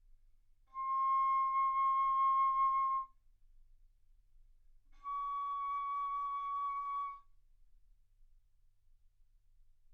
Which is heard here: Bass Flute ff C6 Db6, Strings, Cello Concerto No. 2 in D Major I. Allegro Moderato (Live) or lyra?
Bass Flute ff C6 Db6